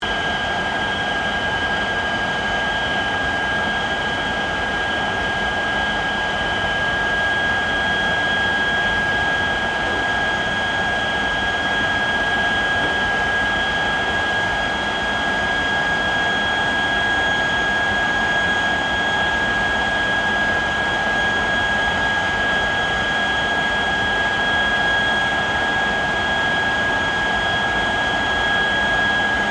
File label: AIRCRAFT GLOSTER METEOR F MK8 IDLE; Gloster Meteor figter jet; Airplanes; Vehicles; Aircraft Collection; Twistedtracks